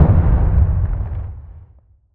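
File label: explosion short deep; weapons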